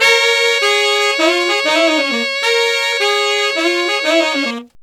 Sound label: Sax Section in Bb, Jazz; Sonic Foundry (Sony Creative Software); Jazz Solos and Sections